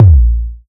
HOUSE DRUM BD; AKAI S6000 CD-ROM - Volume 1; DRUM RYTHM

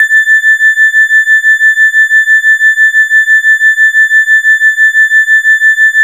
E-HARP, DX HARP; Wizoo - Powered DX

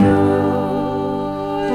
Synth Chords; Eclectic Electronica

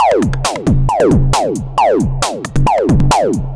Lazer Face 135BPM, sci-fi Beats

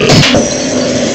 FACTORY, MACHINES; M8; CMI Factory

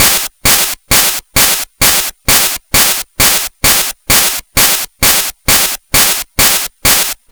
Game Boy Noise Hat Loop, 8-Bit, Drums

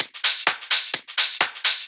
Discohouse Loop 128 BPM

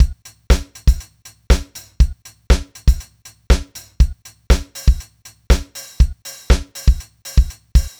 house 4 bars, Beats, Nu Jazz House, 120; PowerFX

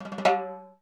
TIMB, SY99; Crypton - DrumBase5000

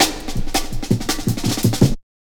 Pride Cut, Drum Loops, 165.5, Jungle

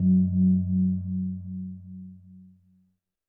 percussion, Roland TD-20

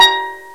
STEEL, MALLETS

